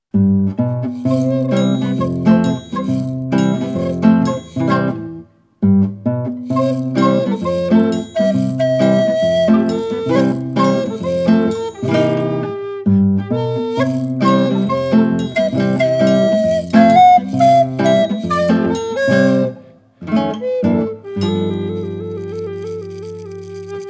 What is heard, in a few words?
start music stereo